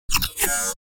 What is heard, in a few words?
Glitch accent broken robot